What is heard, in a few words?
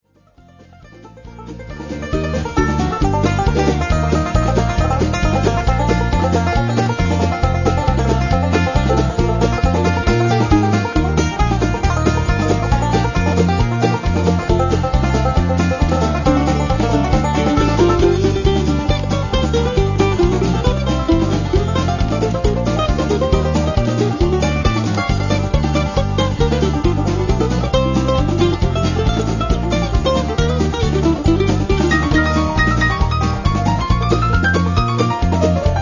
twang